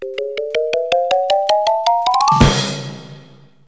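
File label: xylo crash, music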